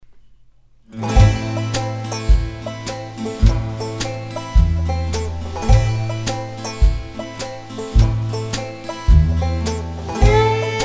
Violin Porch Fiddlin; Embertone; Friedlander Violin

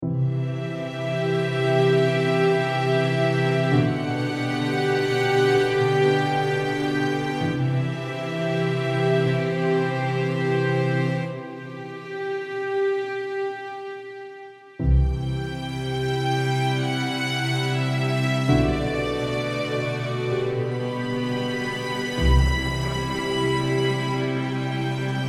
Hyperion Strings Micro; Marie-Anne Fischer; Eos; Soundiron

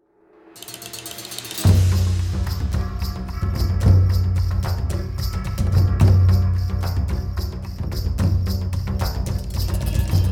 jungle hunt

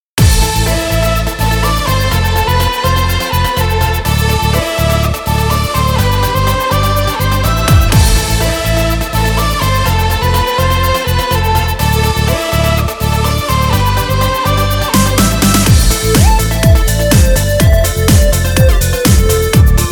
Dance Song

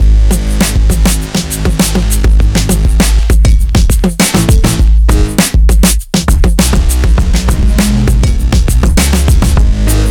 Slammin; maschine

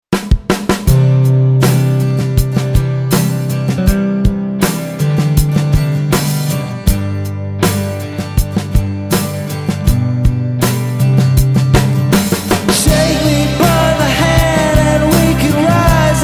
Brit Pop

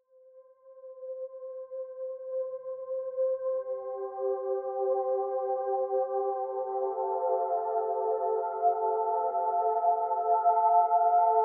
bowed piano

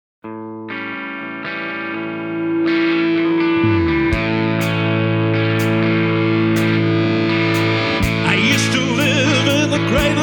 Rock Stereo Mix; u-he

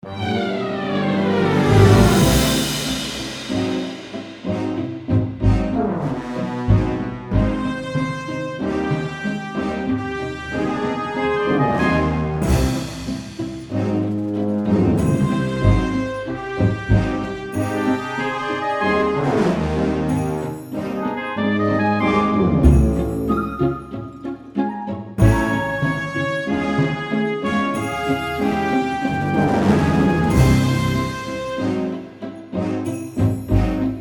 Brass Elements; Soundiron Hyperion Brass Elements

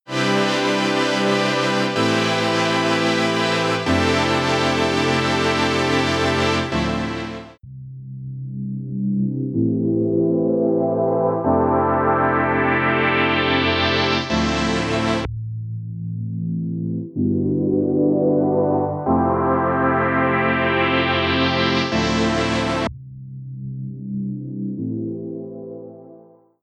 SEM 4 Bars Buildup Dry; arturia

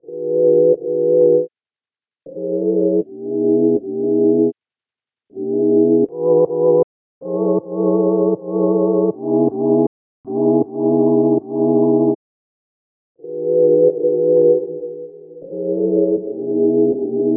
Pulse Lofi Pads; Wave Alchemy

